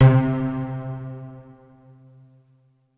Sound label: PIZZ C3, SYNTHSTRINGS; Music Store - Claudius Diemeter Schwerlos